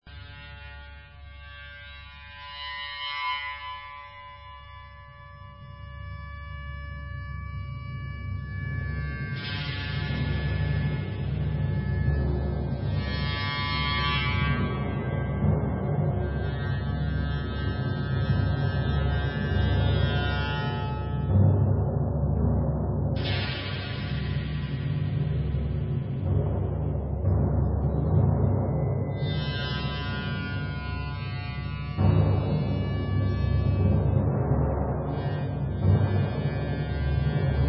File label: Overtone Ensemble